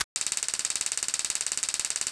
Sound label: taser fire; GTA IV; LCPDFR